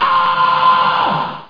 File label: AHHHHH